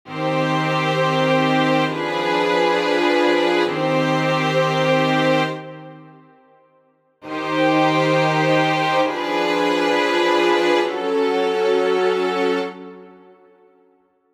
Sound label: dim7 enharmonic